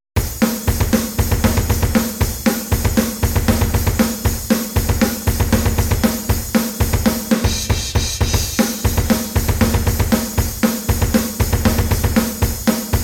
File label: Drum Demo On